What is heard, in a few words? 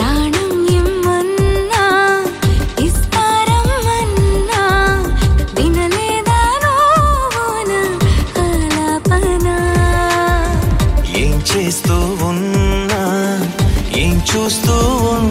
telugu song ringtone